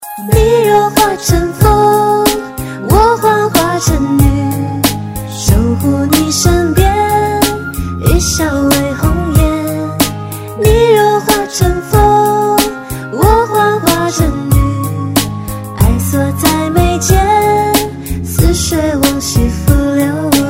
China Music

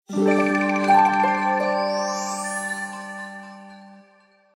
Harmonic

